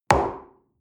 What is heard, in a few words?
coffee place table medium short